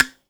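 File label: BAGLAM PIZZ G 5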